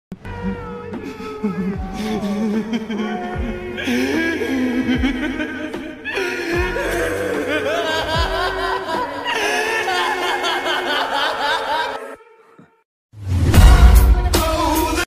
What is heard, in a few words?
laughs; Light Yagami; لایت یاگامی